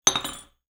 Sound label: coffee cup; drinks